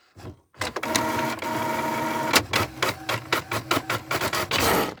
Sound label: diagnoser printing, Machines